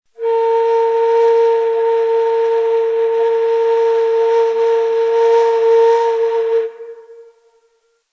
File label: Shuri, Ney